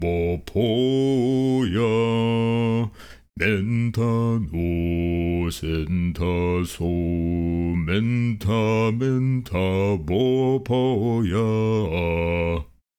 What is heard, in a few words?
Male NPC Song; Hollow Knight: Silksong